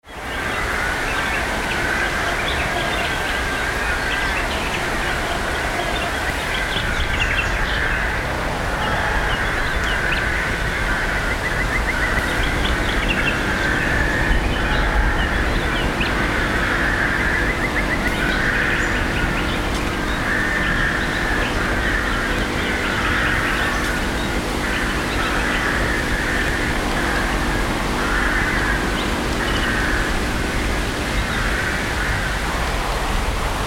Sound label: Parking Lot day; TreasureIsland